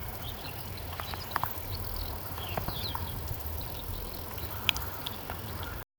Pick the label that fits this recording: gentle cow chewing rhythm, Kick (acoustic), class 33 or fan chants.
gentle cow chewing rhythm